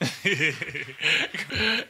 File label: laugh